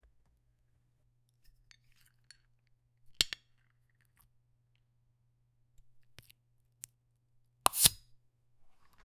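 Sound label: opening a carbonated bottle, bubbly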